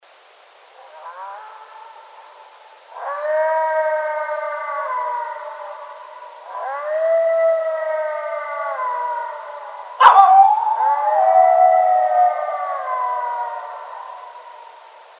Illinois Howl

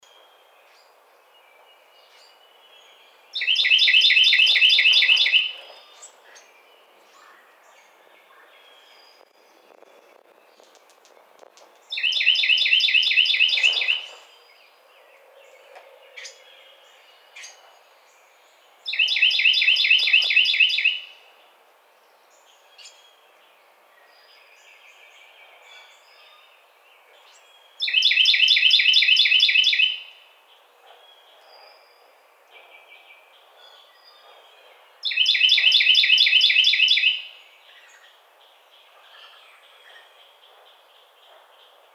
Carolina Wren

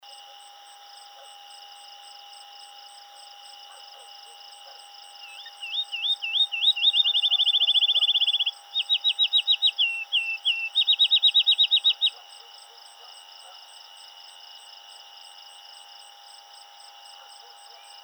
Field Sparrow

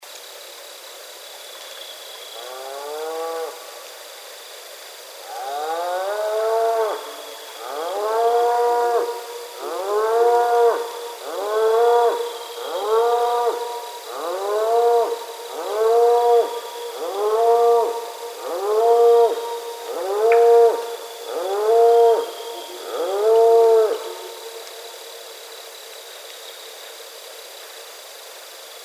Cattle

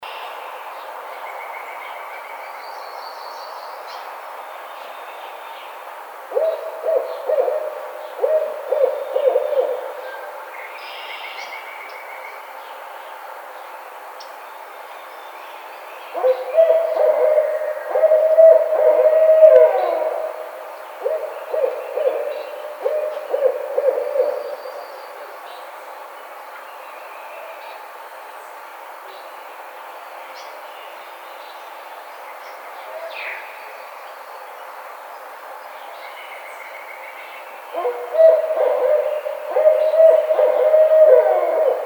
Barred Owl

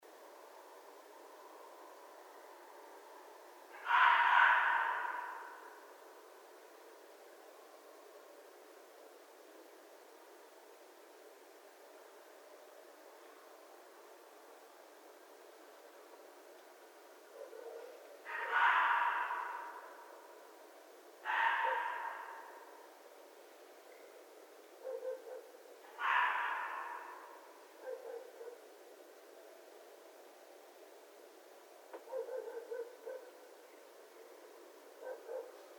Red Fox, mammals; North America

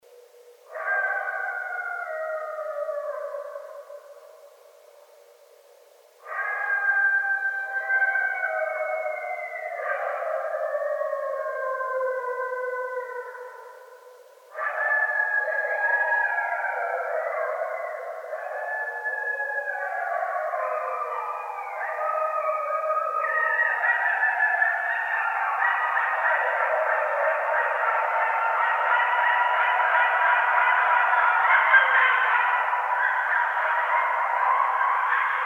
Coyotes; Coyotes (Canis latrans)

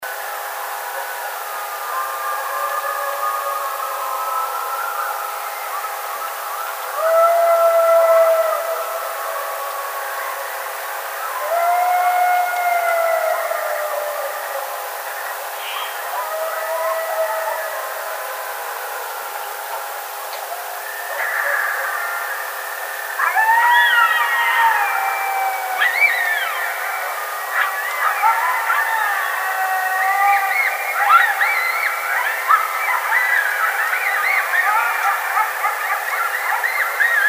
howl, Coyote; Coyotes (Canis latrans)

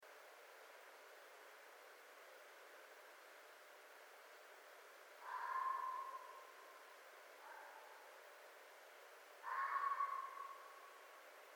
Red Fox long; mammals; North America

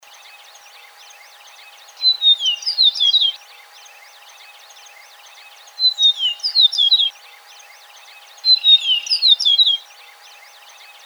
Eastern Meadowlark

